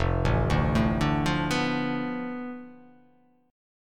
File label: F 6th Flat 5th F down, chord